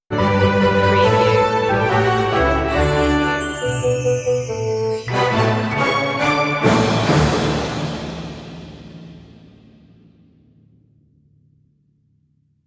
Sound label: deck the halls orchestral stinger; media music now